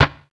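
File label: exterior backfire, Vehicle; NASCAR Thunder 2004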